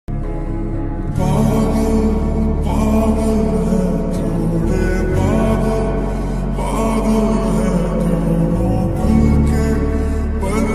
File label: attitude ringtone english ringtone